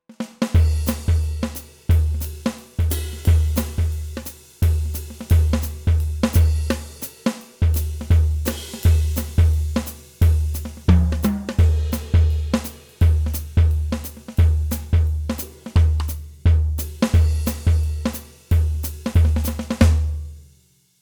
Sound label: Jazz Kit